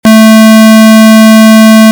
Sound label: square wave